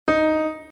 d#2, piano

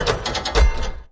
item button

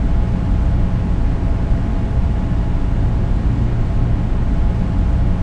generator hum low; ambient; shutdown

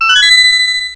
item key; zelda

